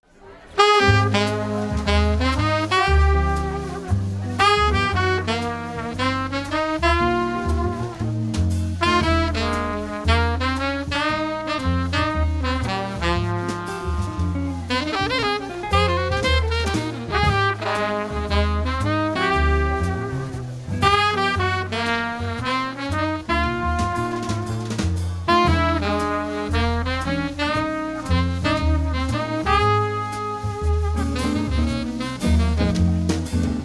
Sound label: Jazz Memories Blues